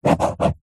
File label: office pen ballpoint paper hollow table draw triangle; zapsplat